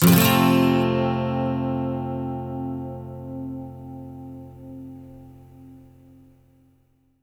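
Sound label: 12 string major, Acoustic Guitar Loops; USB Soundscan vol.04; AKAI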